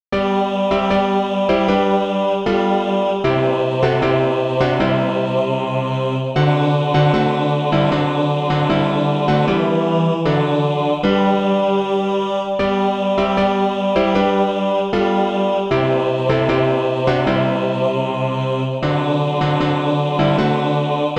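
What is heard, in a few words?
bass, Practise Music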